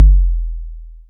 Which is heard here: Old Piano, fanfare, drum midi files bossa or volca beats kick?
volca beats kick